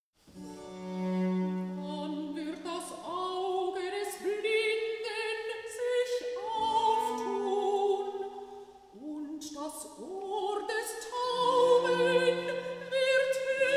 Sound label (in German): Der Messias Recitativo Alto; Haendel